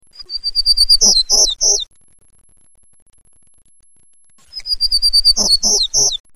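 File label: Townsend's Warbler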